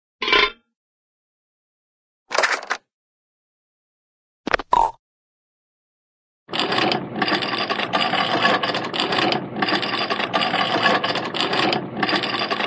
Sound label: rattle, cartoon rattle can on bars